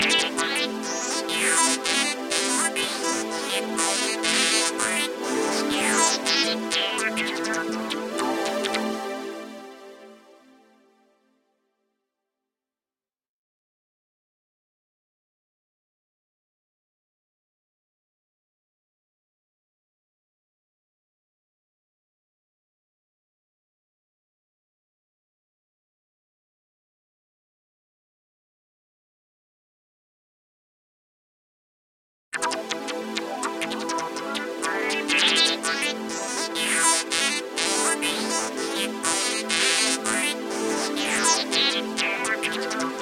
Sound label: SYNTH PAD